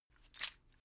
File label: metal chain movement single